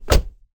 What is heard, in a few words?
car door; Mono